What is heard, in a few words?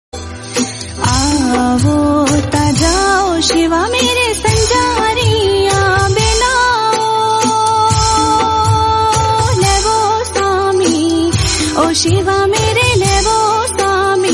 Himachali